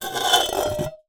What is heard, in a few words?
Drag, Vase